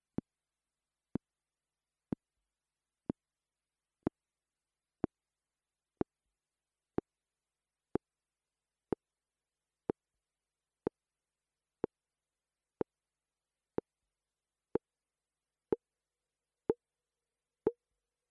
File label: MFB Tanzbaer High Tom Conga